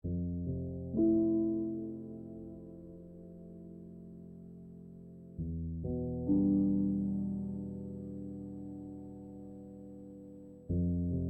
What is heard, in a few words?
Feelings Of Twilight Loop